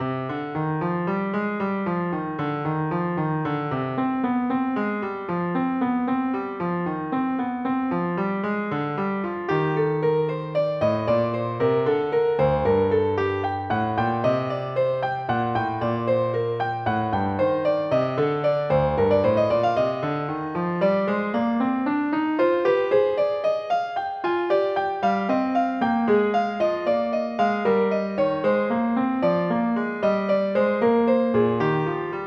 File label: Fugue in Cm; MiniTrax 1.54